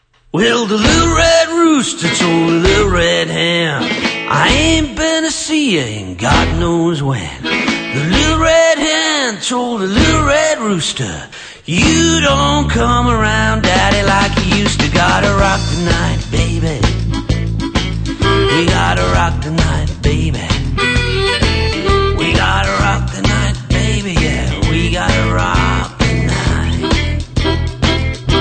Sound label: rooster blues